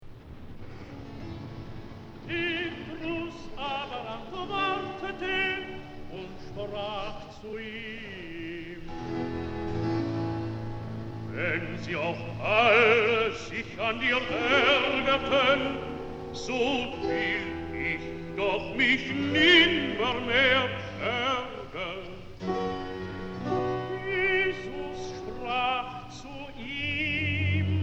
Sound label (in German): rezitativ; Bach, Johann Sebastian; Matthäus Passion; petrus aber antwortete